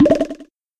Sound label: spring; rabbit samurai 2